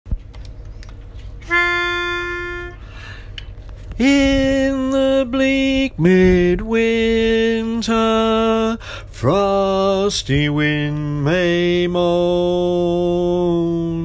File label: Baritone